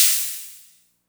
NOISE CRASH; drums pads; noises

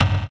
PERCUSSION

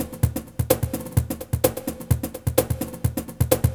Percussive Loops

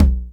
Percussion

